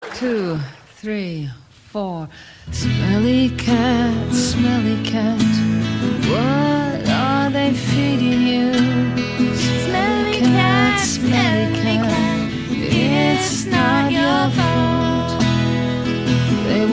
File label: duet